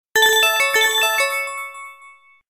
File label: Sparkle tone